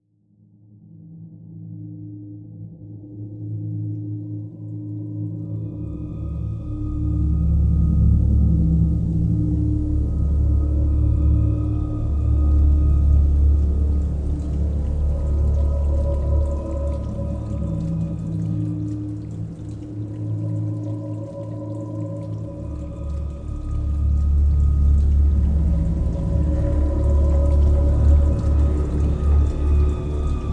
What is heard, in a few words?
water loop; Tribes2; ironsphererpg